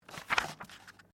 Book Page Turn; Book movement; Books (Sound Effects:Offices)

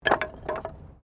Wood Fall; Material components for constructing more complex sounds; Wood (Sound Effects:Materials)

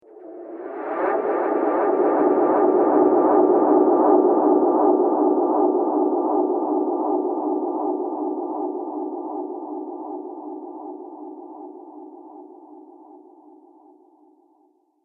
Magic Surreal Soundscape

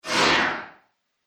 Arcade Game Wizard Whoosh; Video Game Sound Effects; Retro Inspired; Nintendo, Atari, C64

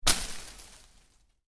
Foliage Impact, Medium Foliage Hit; Impacts; Miscellaneous Impact

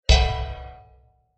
Bright Metal Impact; Blasts; Impacts; Crafted Signals

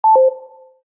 Menu SFX: Go Back

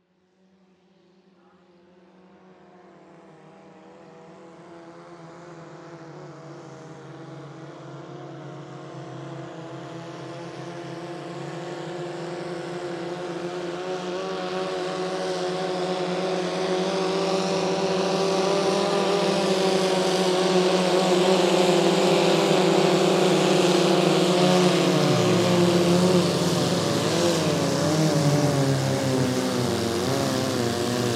Swamp Jeep Pass By Multiple Fast; Transportation Watercraft; Boat; Boat Sound Effects; Blastwave

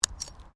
Digging Small Handheld Tool Rocks

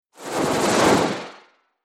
Rippled Swish

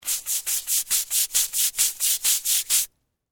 Household, Cleaning, Brush, Scrub; Cleaning (Sound Effects:Household)

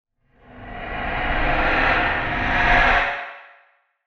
Creepy Monster Breath Transition; Scary Soundscapes (Sound Effects:Horror)

